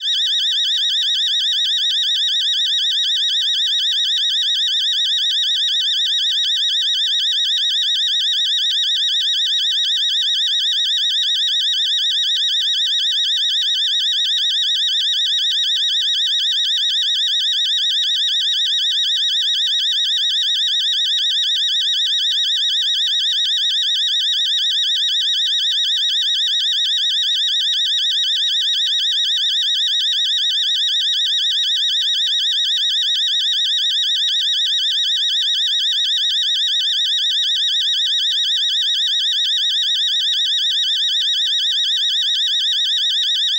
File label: Alarms Siren High-Pitch Constant-Tone High-Rate; ShapingWaves